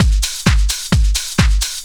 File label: dr t 130 trance 2; Ueberschall; Techno Trance Essentials; DRUMLOOPS